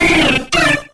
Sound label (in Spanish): Grito de Spearow